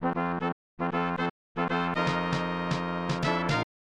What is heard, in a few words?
Clear Level; prehistorik man; snes